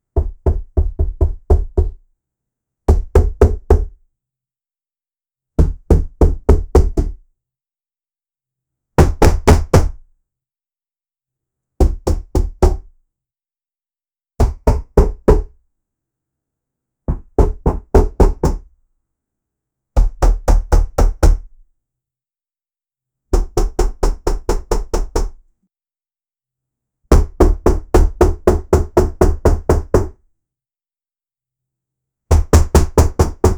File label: high quality random door, doors